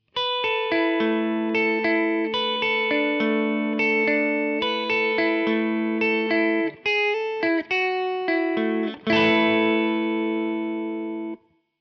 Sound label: guitar Arrpegio